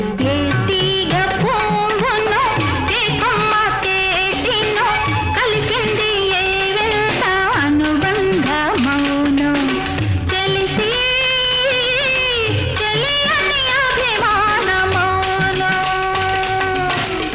female; Maro Charitra

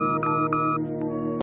notifi; Death note

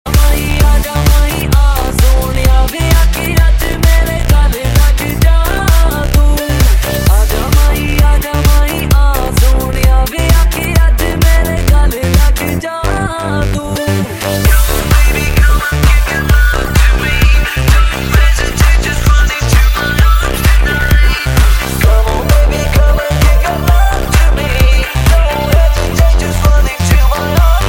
Beats

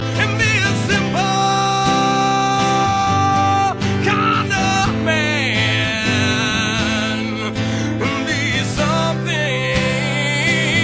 Boom Clap Clap

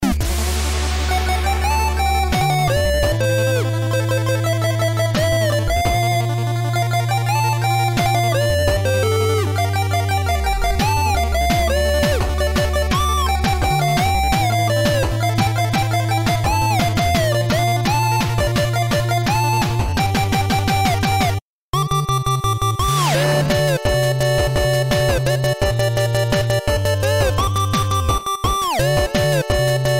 8bit

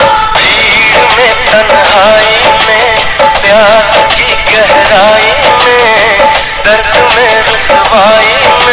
Classical

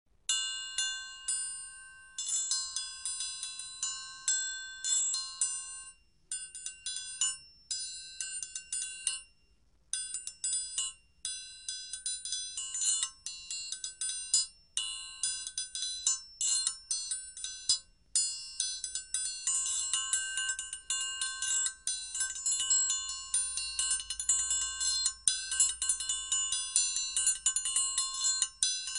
Triangle Sound

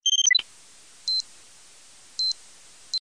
Message Signal

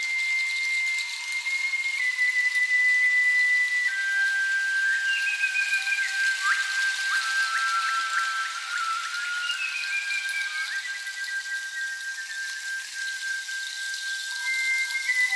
ambient japan flute

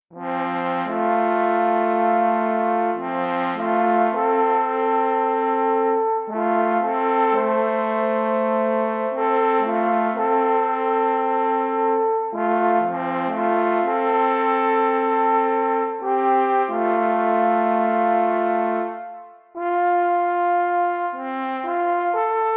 alphorn

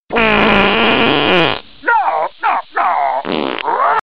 Fart; Yo-dah

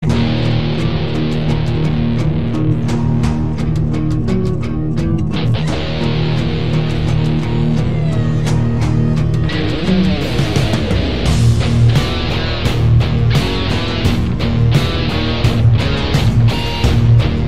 Metal Cover

